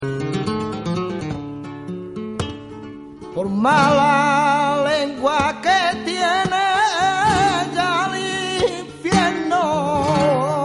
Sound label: solea